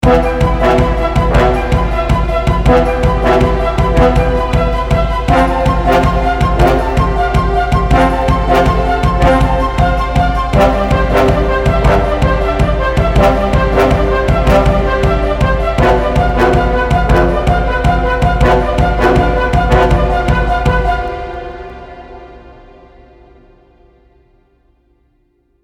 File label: War - Medium Intensity; Poignant Symphony Music Modules